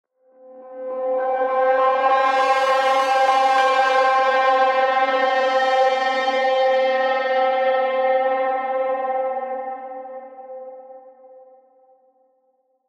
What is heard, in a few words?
Guitar Bend C